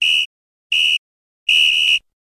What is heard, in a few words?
Referees whistle end of the game